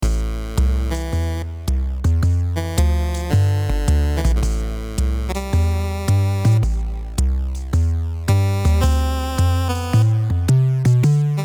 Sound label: Basement Jazz; hot tin roof original soundtrack